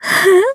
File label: Taking Damage Electricity; BBCF; Izanami